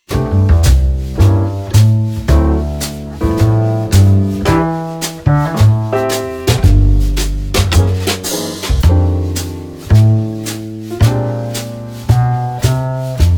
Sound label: F BLUES SWING, flutist; chapter 2 the minor blues scales; Practice Song No 3